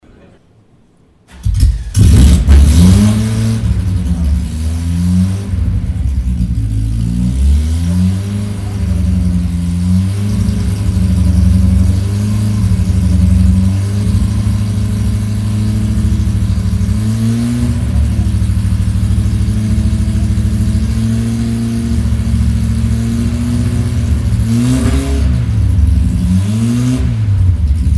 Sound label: Porsche 718 RS 60; Porsche Soundnacht 2016